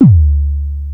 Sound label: highkick, Chroma Polaris